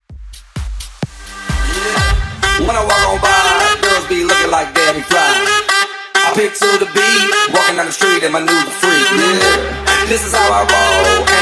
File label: Tech House 129 Bpm